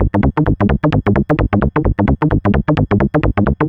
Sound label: Synth, Techno